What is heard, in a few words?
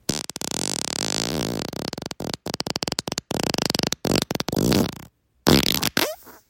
farts